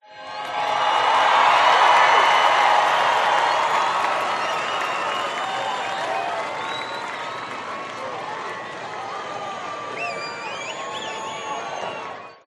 american football fans applause scream cheer usa high school